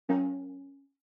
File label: OS Sound (Speaker-Notification)